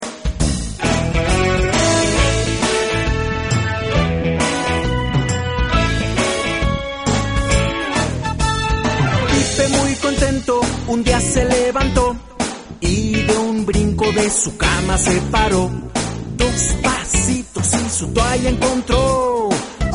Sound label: pipe